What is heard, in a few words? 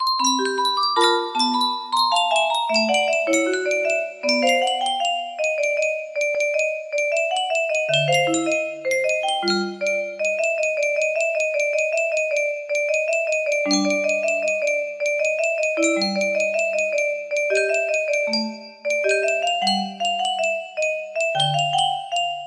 harp, music box melody